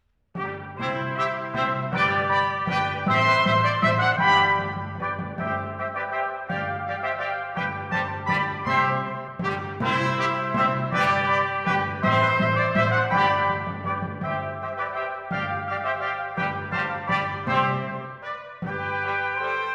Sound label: Divertimento in C major, for 5 trumpets & 4 timpani - Menuetto; Mozart; Serenades-Divertimenti-Dances